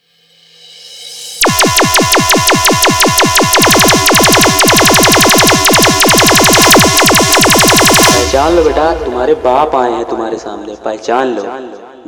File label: Tahlka Beat